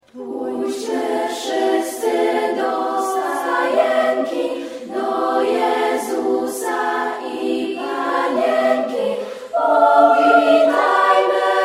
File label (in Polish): dzwonek